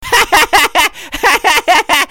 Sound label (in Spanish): Risas